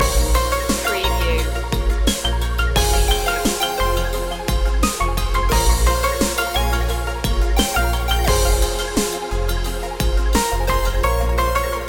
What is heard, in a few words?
dub glide loop